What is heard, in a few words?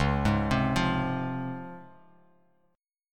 C sharp Major 7th C sharp; C#M7 arpeggiated; JGuitar